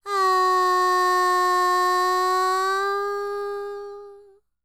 World Voices, INDIA; ILIO; Vocal Planet

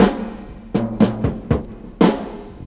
Drum Roll; Jungle

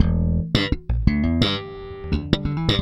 Slap, Bass; Bass X

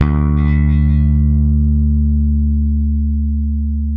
ROCK D 3, Bass, ROCKER; Spectrasonics; Bass Legends

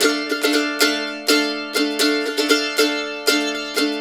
Blues Guitar Samples, RIFF; ILIO; Fretworks; CEE RIFF

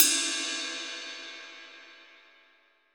RIDE, Ride Cymbal; MONO RIDE CYMBAL 1; AKAI S6000 CD-ROM - Volume 3

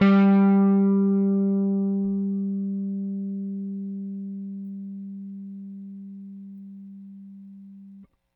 Rick, Rickenbacker Bass; Airwindows

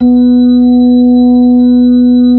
B-3, Brite; Keyboards of The 60's and 70's

